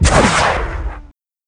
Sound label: missile fire